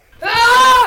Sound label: roarrrrr